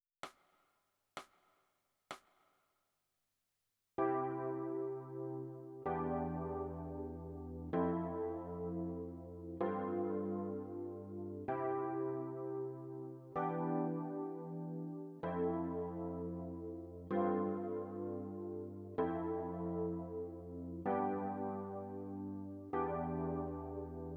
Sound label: E Piano